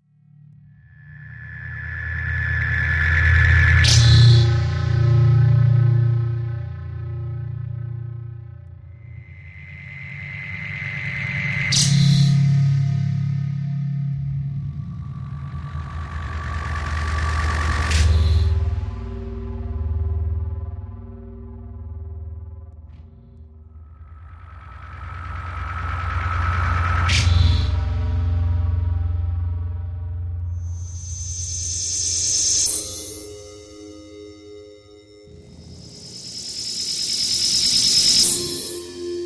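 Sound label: Volt Maker Electro Static, energy beams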